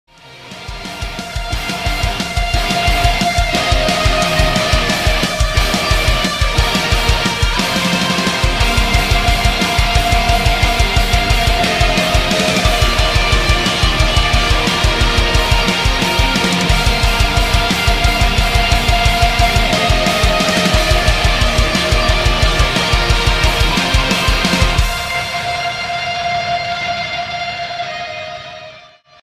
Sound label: Ringtone